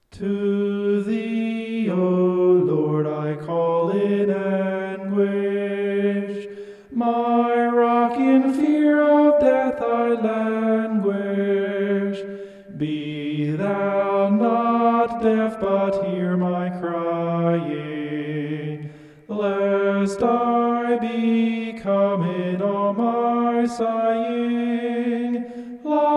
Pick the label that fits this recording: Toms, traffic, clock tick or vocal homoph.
vocal homoph